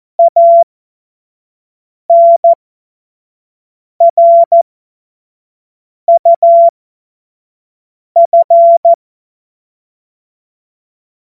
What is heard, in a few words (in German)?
Witz, Morsekurs, 25 F70; Witze